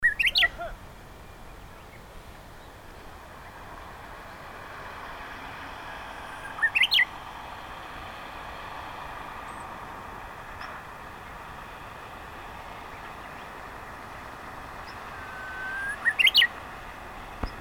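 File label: uguisu, Japanese bush warbler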